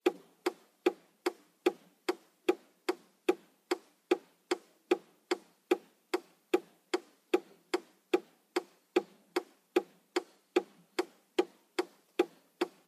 zvuki povorotnikov u avtomobilia

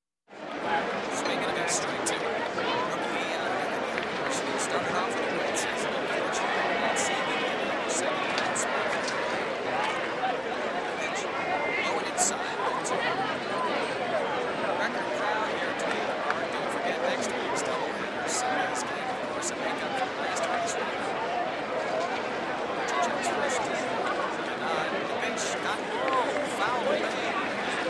zvuki beisbola

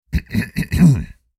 zvuki pershenie v gorle kkhm

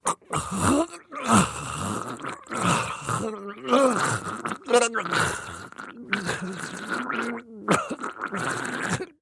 zvuki pererezaniia gorla

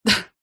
zvuki zhenskogo smekha

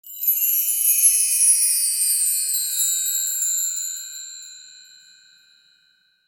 zvuki volshebnoi fei

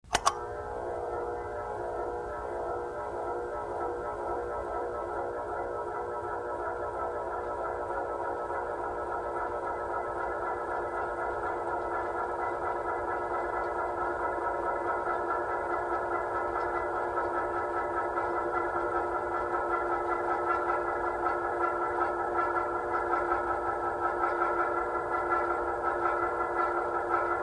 zvuki speshki

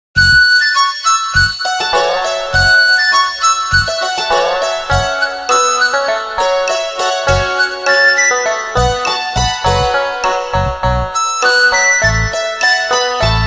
Nokia 808 originalnye ringtony i uvedomleniia

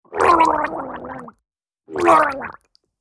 zvuki smerti i boli

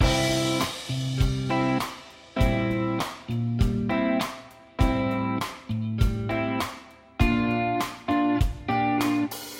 zatsiklennye melodii dlia gitary loop

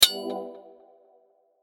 zvuki volshebnoi fei

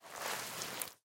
zvuki vafelnogo polotentsa

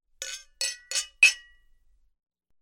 zvuki chokania na prazdnike

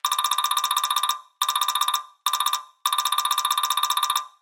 elektronnye zvuki peredachi dannykh

